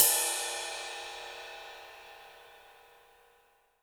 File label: Gretsch Ride Cymbal Hit; Sonic Foundry (Sony Creative Software); Vital Drums